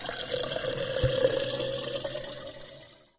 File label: gas